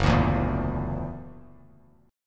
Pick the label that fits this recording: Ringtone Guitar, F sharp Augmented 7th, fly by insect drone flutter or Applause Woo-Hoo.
F sharp Augmented 7th